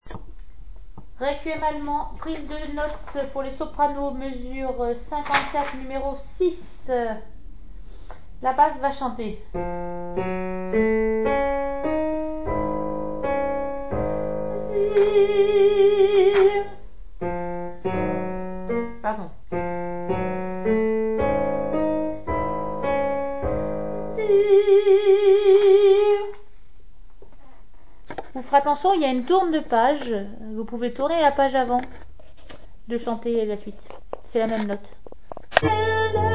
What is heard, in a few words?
soprano; Brahms requiem allemand; mesures 52 à 53 prise de note